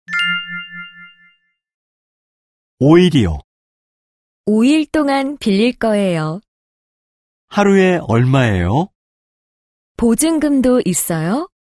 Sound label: K-POP